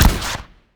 rocket fire

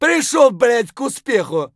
hurt near death; Escape from Tarkov; scav2

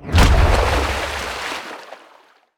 depth bomb; World Of Warships; weapons

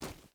footstep hide gravel run; Rust

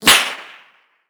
bullet flyby rifle; Rust

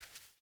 footstep burlap concrete walk; Rust

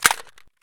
saiga magrelease button hit; Escape from Tarkov

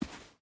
footstep boots sand walk; Rust

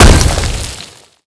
Projectile Sounds; Red Orchestra 41-45

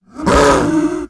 Panther Die Vox; Civ4 warlords

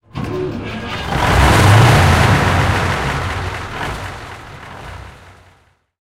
su 100 engine int start, tanks; engines; War Thunder

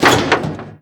mtl sheet

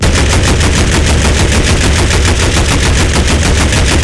N37; Weapons; DCS

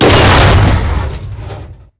tank impact tank; tanks; War Thunder

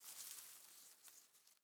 leaf rustle grassy soft

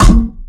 tank hit small interior; tanks; War Thunder

